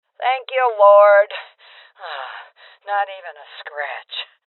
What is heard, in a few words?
Voices Armor Shot Female Old; rebel cops 2019 gamerip